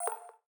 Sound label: graphics digital click; soundscrate